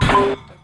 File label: Toy crash glitch